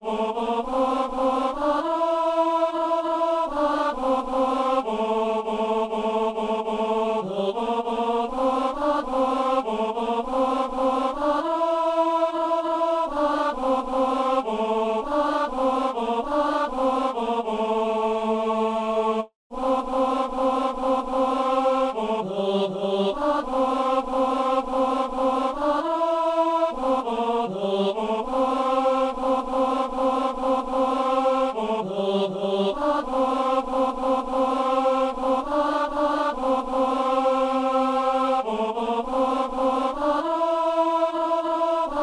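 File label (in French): ténor; a lamez votre dieu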